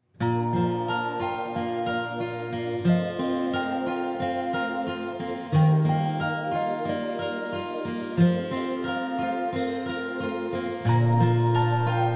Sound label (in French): Au nom du Pere (inst)